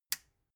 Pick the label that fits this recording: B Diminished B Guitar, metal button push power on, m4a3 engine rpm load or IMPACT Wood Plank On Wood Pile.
metal button push power on